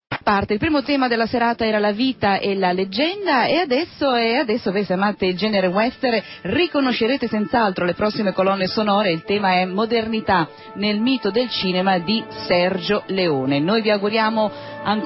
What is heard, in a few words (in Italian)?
Applauso E Commento